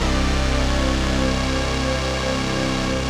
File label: basses; Wizoo - Powered Wave